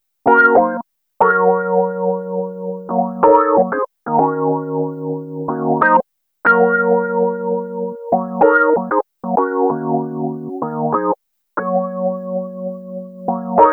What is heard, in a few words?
Piano, Electro Lounge; Starksky Piano; Sonic Foundry (Sony Creative Software)